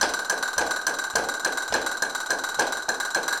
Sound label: CASTANETS, Flamenco